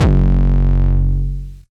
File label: 808 Dist - F#1, Kicks; Mbase 11 Kicks